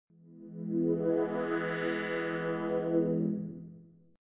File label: gong